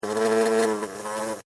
Hornet Hover, Hornet, Hover, Close, Fly, Wings, Buzz, Insect, Bug; Sound Effects:Animals:Insects; Blastwave